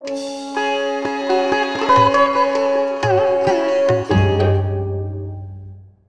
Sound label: Bari Sax